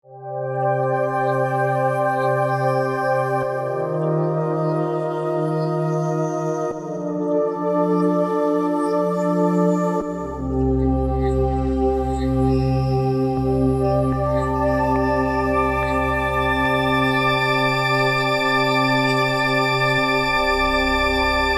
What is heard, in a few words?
Kore2 Deep Pads